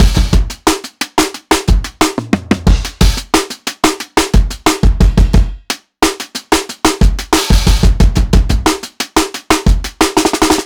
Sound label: Short Heavy Finger Drumming